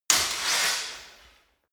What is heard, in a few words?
sword hit scrape sword